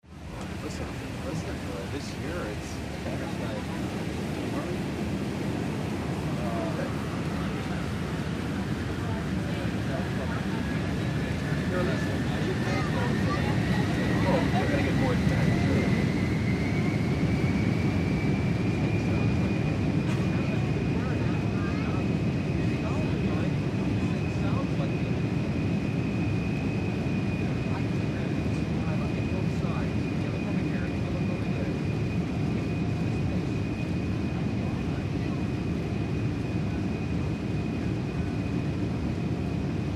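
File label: 747 Jet Interior Takeoff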